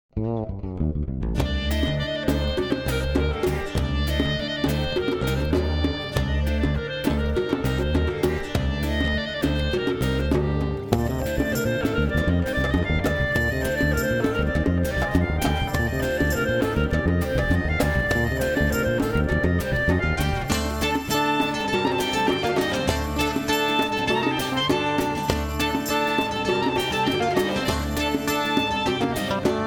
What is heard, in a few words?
Jewish Jig